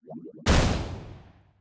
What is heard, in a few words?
naut shoot; Risk of Rain